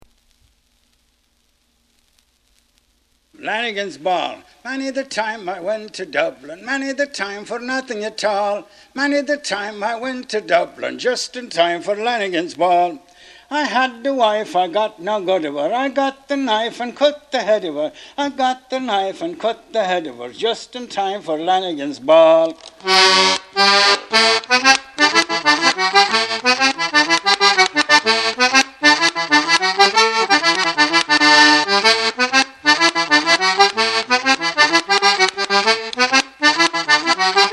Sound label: Jig; Lanigans Ball